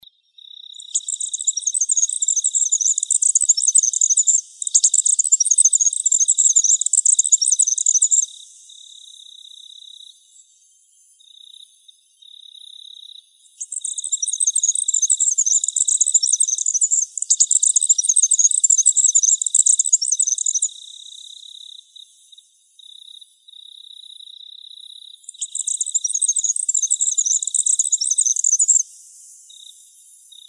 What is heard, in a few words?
Emberizids; AZFO; deviche